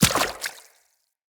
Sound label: Infested Medium Run Water, Footsteps